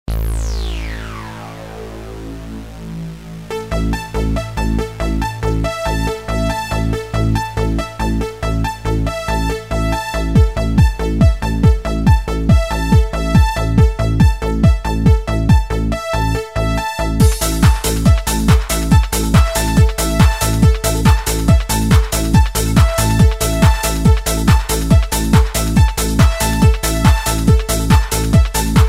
dance music

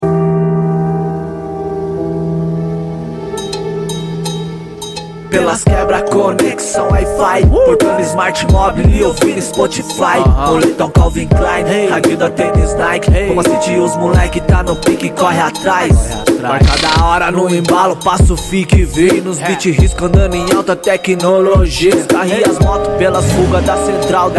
rap

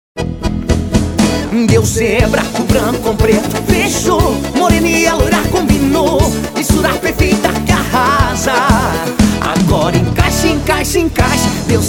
drums

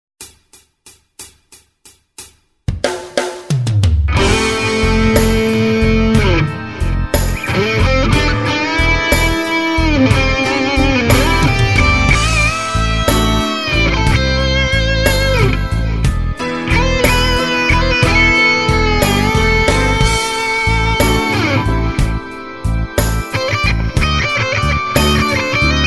solo blues

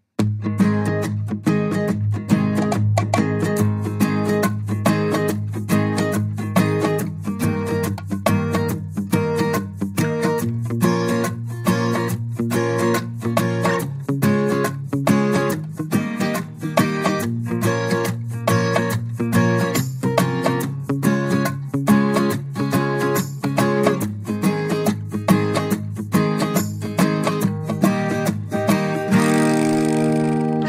raggae

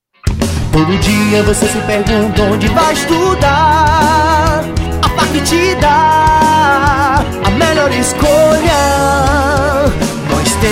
jingle

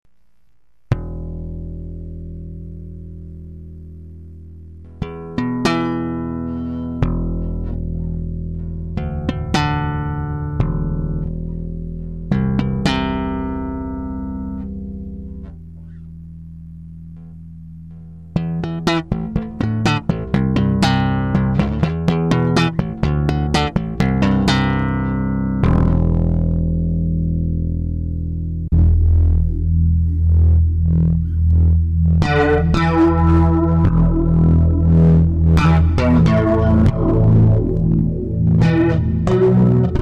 bass improviso

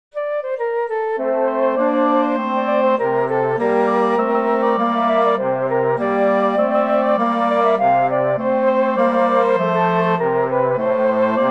winds quartet

